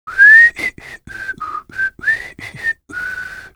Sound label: fish whistling, fisherman; lostcoast